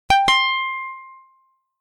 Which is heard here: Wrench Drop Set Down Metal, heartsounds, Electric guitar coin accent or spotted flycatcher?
Electric guitar coin accent